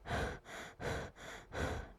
Breath

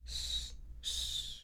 Shhh

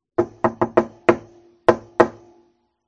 Knock door

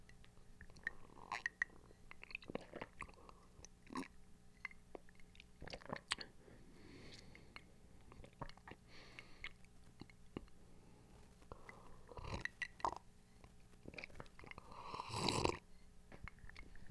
Slurping

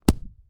Book Impact

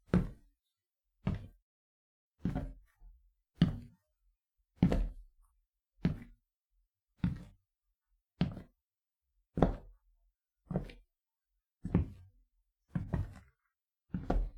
Footsteps Stone

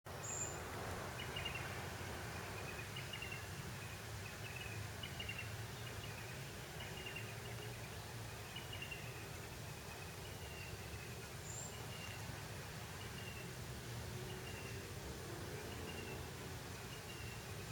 Backyard Nature

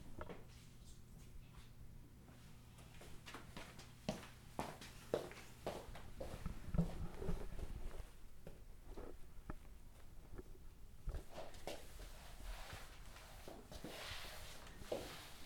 Cleaning Floor

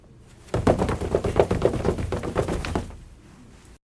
Running

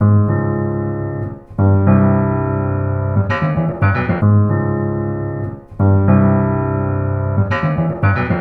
Mallets On Piano 114bpm

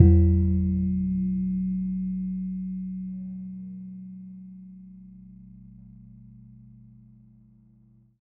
Underwater Drum Hit E1

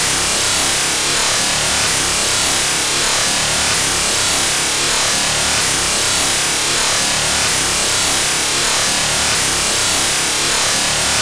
Scary Rev Up Noise Bit Looped

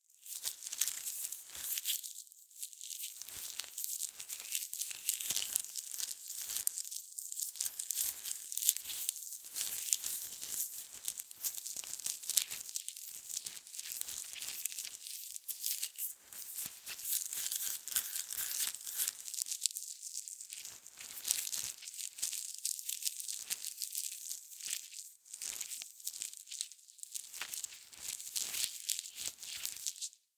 Squishyness

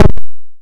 Bounce

